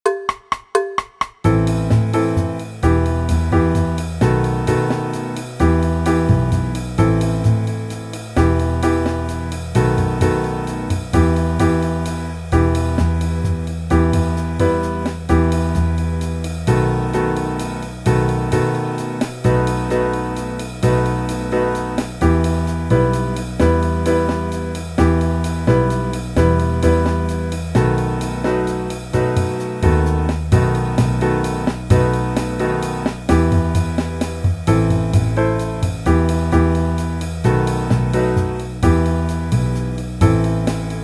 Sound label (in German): Backingtrack